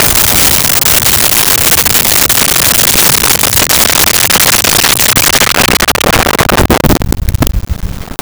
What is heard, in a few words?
Volcano Eruption; Natural Elements; SONY PICTURES SOUND EFFECTS SERIES